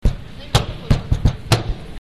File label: tube